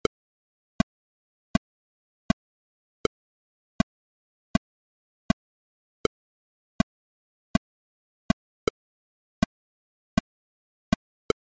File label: Click follow meter ON normal